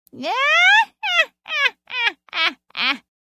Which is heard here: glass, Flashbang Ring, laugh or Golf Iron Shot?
laugh